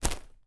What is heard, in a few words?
foley balloon foil inflated grab with hands